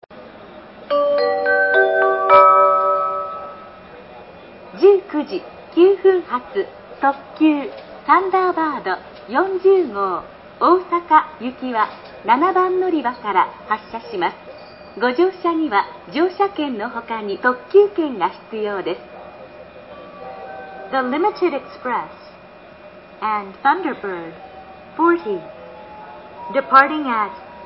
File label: kyoto track7 thunderbard40go; nishinihon